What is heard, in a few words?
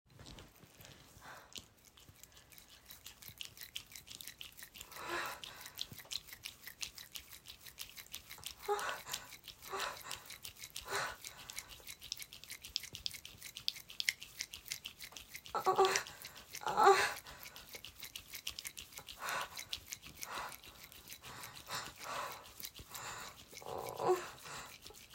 wet sounds, no talking; all fapping; Erotic audio